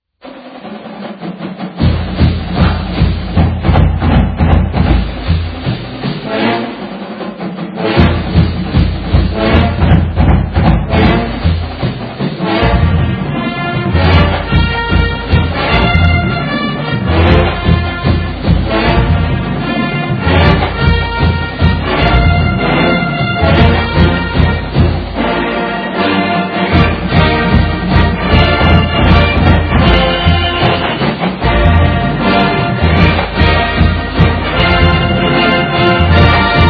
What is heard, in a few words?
Swing March; Arkansas